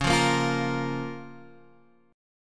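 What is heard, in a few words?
F Suspended 4th Sharp 5th, D flat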